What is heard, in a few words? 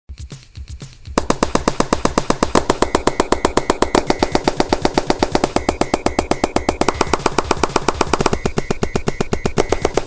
Clap Dance